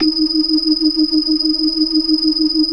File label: organ; Keith Emerson Signature Series